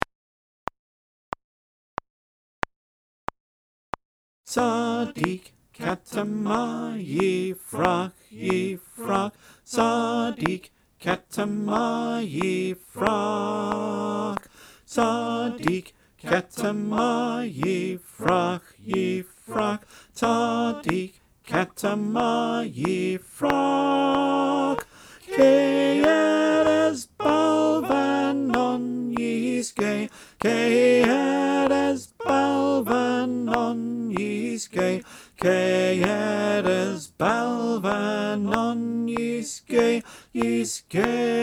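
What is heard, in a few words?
Tenor click track, choir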